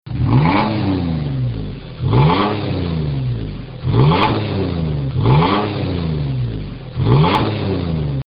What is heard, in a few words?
Xterra 3.4L Single